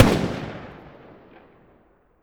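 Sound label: weapon ar fire player atmo exterior; gmod; viper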